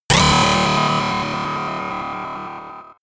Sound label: pitched rattler